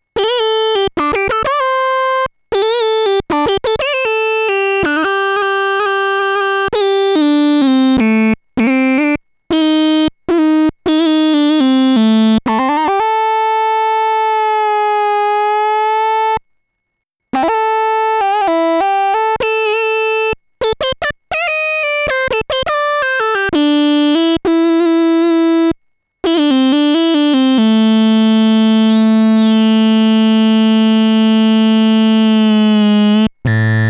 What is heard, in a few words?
monologue mod wheel